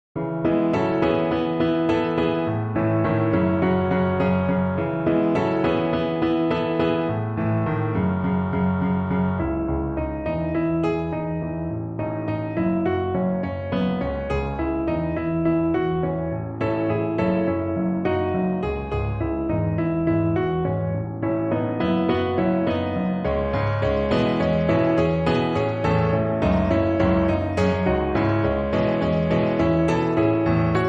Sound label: Under Our Spell (Piano)